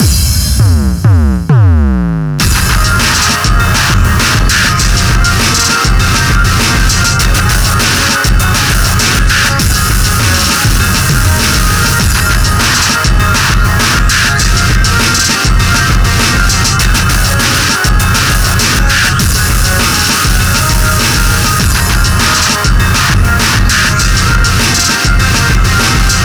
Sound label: bgm boss